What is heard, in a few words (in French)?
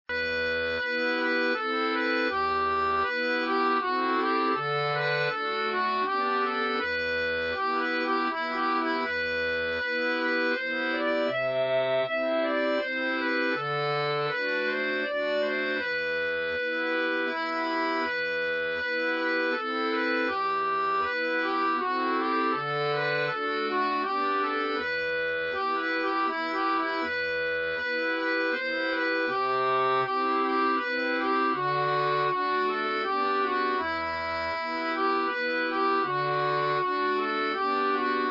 valse